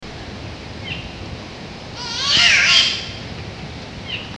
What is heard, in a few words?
spotted catbird